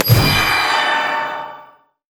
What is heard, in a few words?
item flask of crystal water; LoL; items